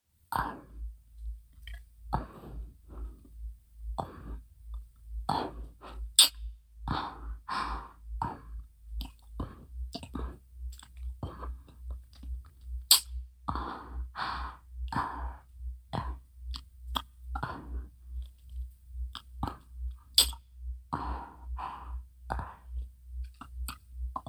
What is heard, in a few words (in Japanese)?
フリー乳首弄りループ音 はむ…はむ…, ASMR